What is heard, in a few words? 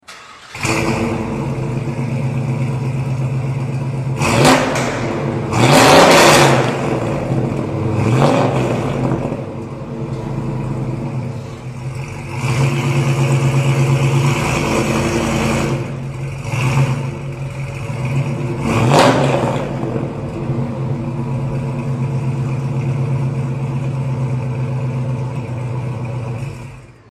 T-Am LT1 Dual Flos, custom exhaust sounds